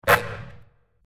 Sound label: Basketball swish